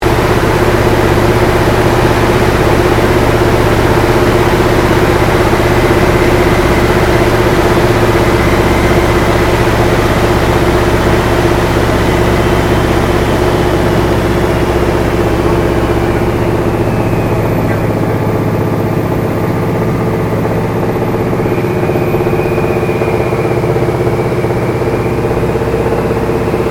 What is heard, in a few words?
AIRCRAFT AT-6D HARVARD MK III FLYING INTERIOR; Harvard Training Aricraft; Airplanes; Vehicles